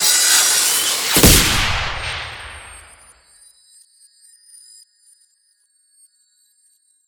Guardian Tear Player Cape; Journey

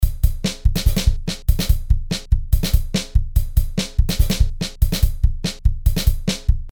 Drum Test

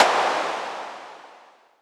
Snare Synth

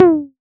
Tom MKS, Drums

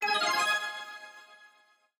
level up

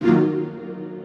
Stab Strings Phased; One Shots; Ableton Live 12 Lite; Core Library

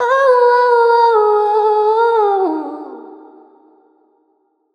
Vocal Whoa; Ableton Live 12 Lite; Core Library

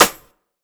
Snare Clap; One Shots; Drums; Days Off

